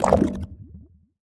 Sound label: Heal Hunger Bad